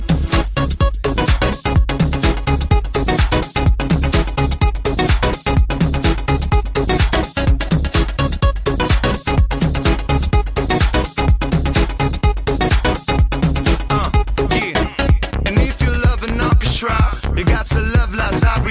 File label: love music